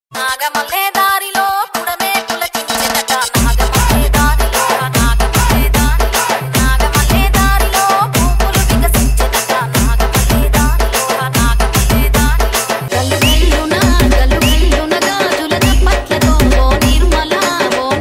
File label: Bathukamma Song